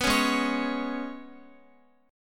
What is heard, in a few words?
Ab Minor Double Flat 5th down Guitar; Abmbb5; strummed